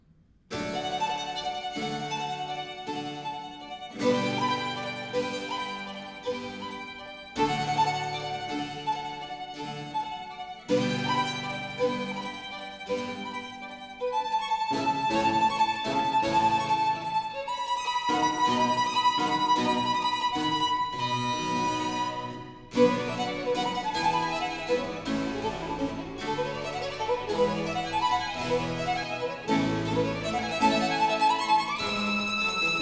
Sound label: Sonata violino solo representativa, for violin & continuo; Biber; Representatio Avium; A major